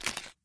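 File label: Movement Crunch; smite; god bakasura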